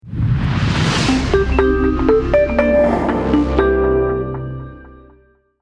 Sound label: cargo jingle